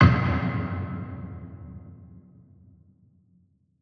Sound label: designer dark wood hit